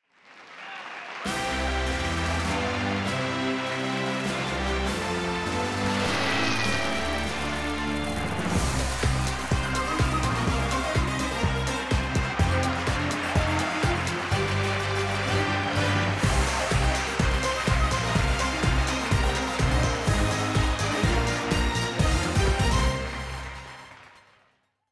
Intro Fanfare; buzz brain of the uk 2009 ps2

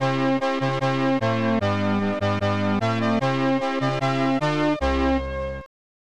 Clear Level; Sink or Swim; SNES

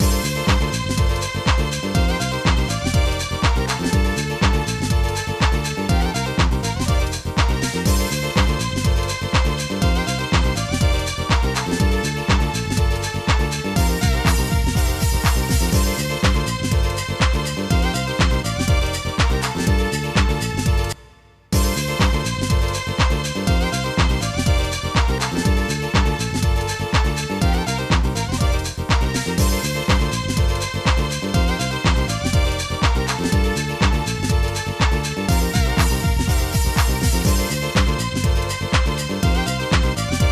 Win; Street Fighter III 3rd Strike Online Edition

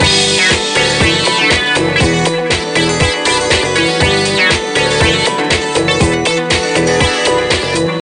Music Dance

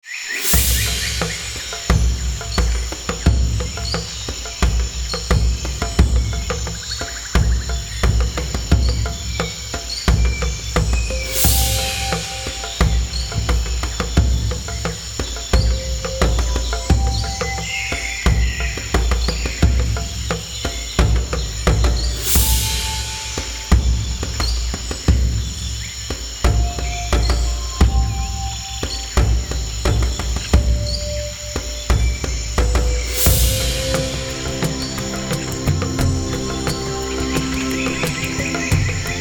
Peace Relax; Serious Sam 2; Mdigbo Approaching City

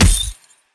Boss Chain Kick; helltaker

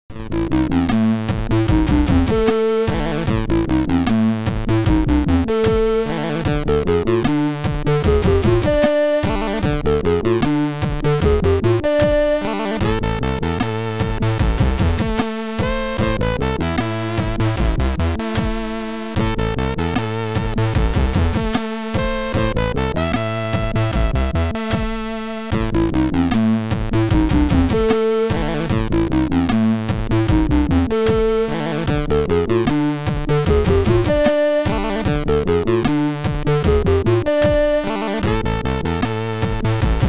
Boss Theme (SID Stereo); toki; commodore 64

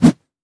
Swing Punch; Afterbern Democralypse; Windows

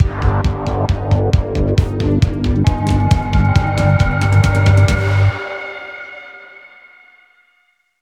Combo Vehicle Sting

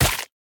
Squished Crush; Boomerang Fu

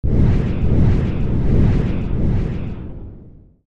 Winged Bomb Launch; online gamerip 2008; Balloon Invasion

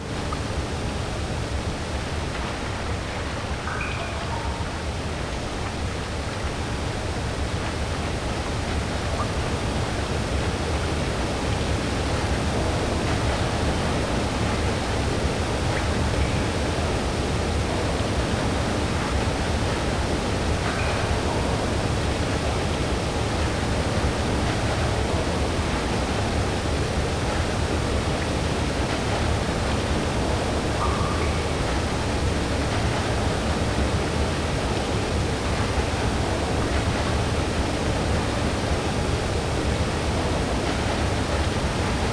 Flooding (with SFX); limbo gamerip

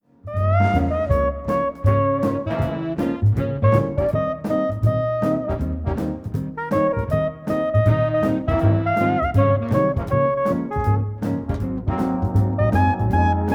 Instrumental; Empire of Sin

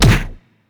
Enemy Kick; helltaker

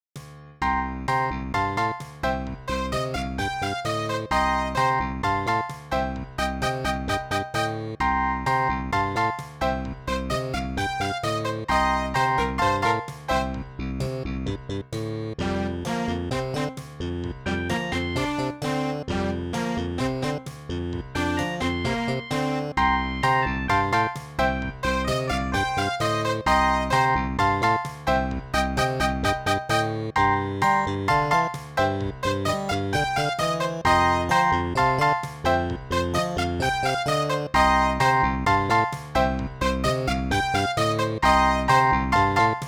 Roland SC-55; SimCity 2000

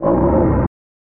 Dragon Roar; dragon s lair snes gamerip

